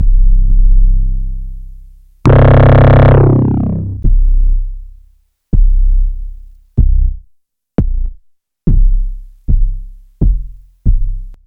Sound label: Bass c